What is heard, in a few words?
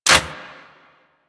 InfQuanta Rifle Mech; Warframe; Infested